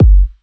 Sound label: bigcheeks kick; Kicks; single hits